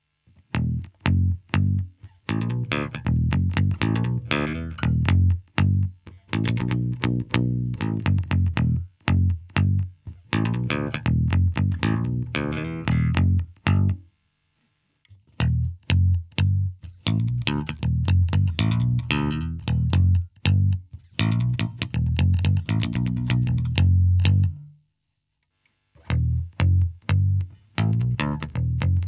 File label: mediator jb roadworn, bass; fender bass roadworn